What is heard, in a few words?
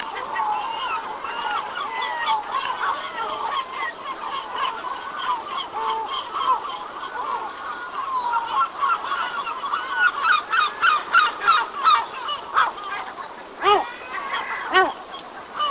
Gumus Marti Larus michahellis Lusitanius